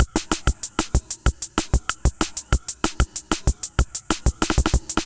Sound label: D55 Beat, Drum Machines; Lorimer; Ableton